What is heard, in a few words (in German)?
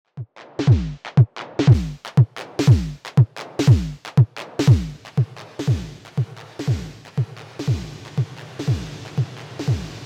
Queen Of Pentacles Effekte Darkwaves